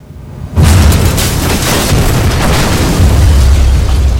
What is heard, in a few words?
bomba